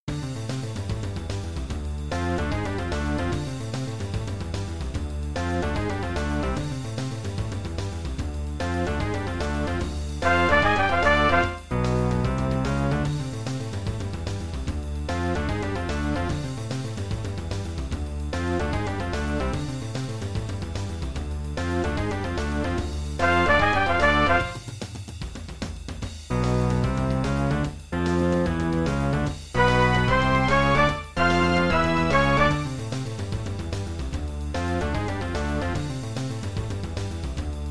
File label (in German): Lied ohne Namen D Moll